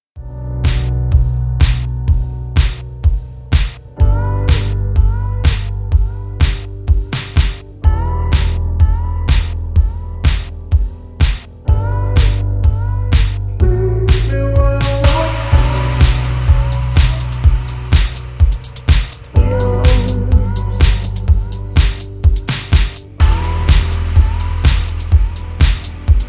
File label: remix